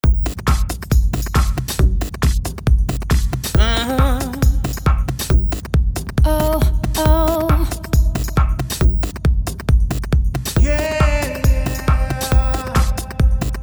Afro Disco

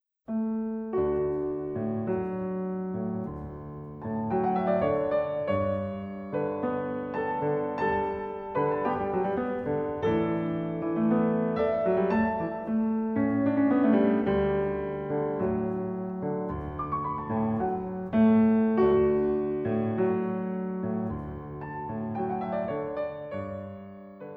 Habanera

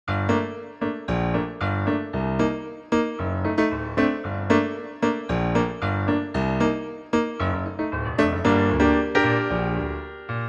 Tango meets Reggae

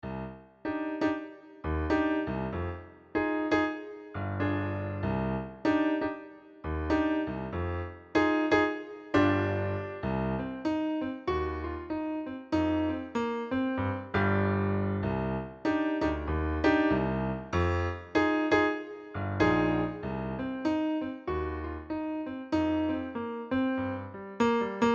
Gangsta Reggae

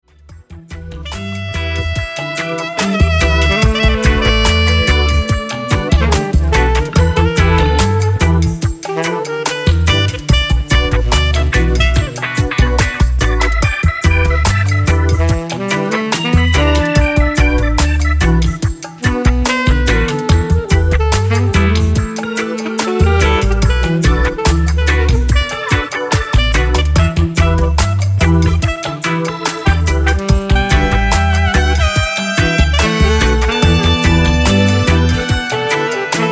Sax Solo

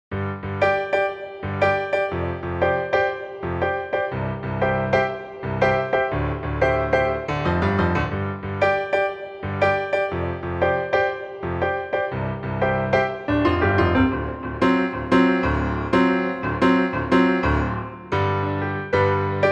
Reggae

